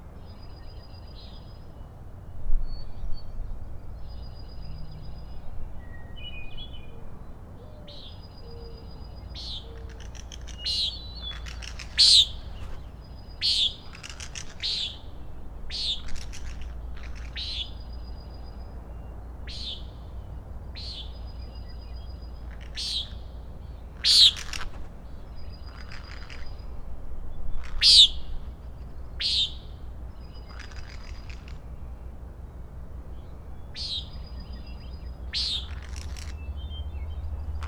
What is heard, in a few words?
common nighthawks rattling